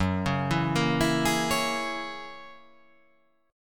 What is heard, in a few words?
F sharp 9th F sharp, chord